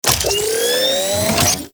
Rifle Alt Fire Charge; Weapons; Warframe; Corpus; Ruban

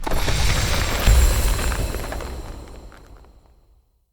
Shrine Portal Warp Action

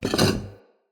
Vase Medium Slide; Vases

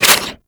roulette projectile jam; Cowgirl

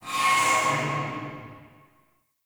Galleon Metal Screech; Warframe; Grineer Galleon

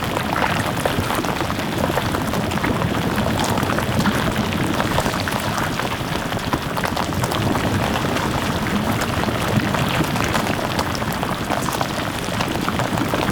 Coolant Flowing Loop; Props; Loops; Warframe; Venus Open World; Venus Landscape

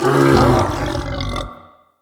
Behind Wall Attack Growl; Vocals; Bromius; Animals

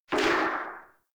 Inf Vomit Gun Tail; Infested; Weapons

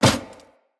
Heavy Grunt Boot Walk Metal, Footsteps; Enemy; Sinecure

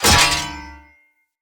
Great Sword Impact Block Defender; Weapon Impacts; Combat

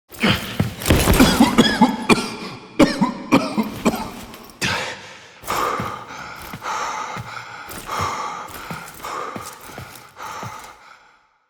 Fall Cough; Entrati Lab Quest; 2023 TennoCon; Arthur

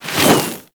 Arachnoid Camper Terra Ice Proj Whizzby; Warframe; Corpus; Abilities

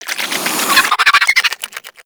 Infested Biped Warning; Warframe

